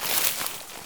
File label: grass step, footsteps